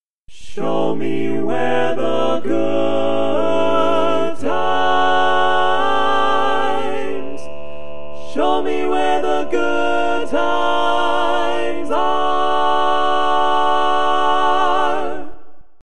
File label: barbershop tags, all parts